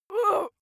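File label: female pain, screams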